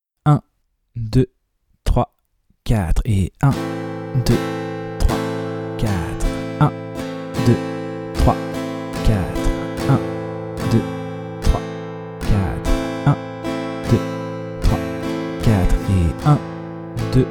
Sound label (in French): lent Travailler la rythmique rapide; De 0 à vos premières suites d'accords